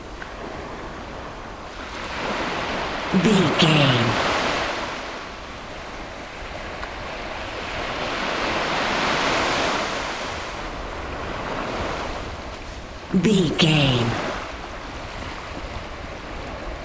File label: beach waves ocean calm loop long version